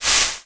water spurt; Tony Hawk's Project 8; XBOX

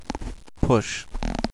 push; Bicycle Casino; XBOX

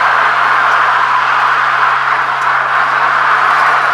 Skid Dirt; Totaled; XBOX; skids

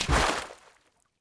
body roll water, foley

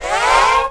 Update Bonus Points; Cars; XBOX

